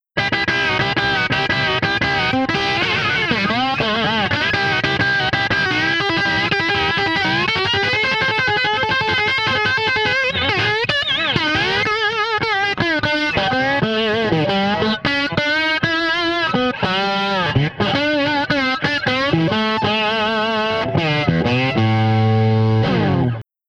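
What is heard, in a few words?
Blues Guitar Breakdown Solo; Digidesign; Pro Tools Essential Loops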